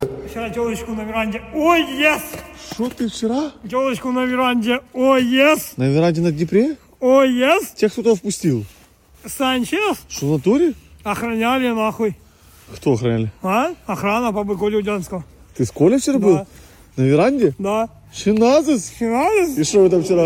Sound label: phonk groove